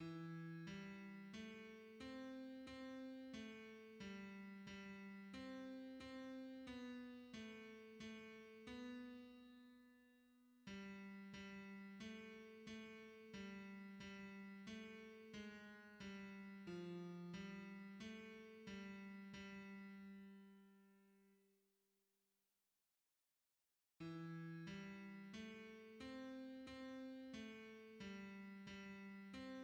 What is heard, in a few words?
tenor; hymns; Now may the God of peace and love